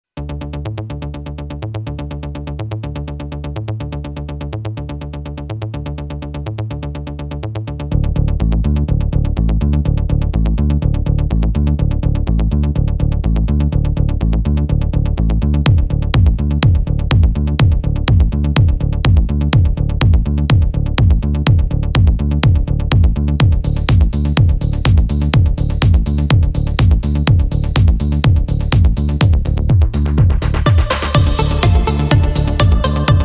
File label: euro disco remix Korg Pa5X Korg Kronos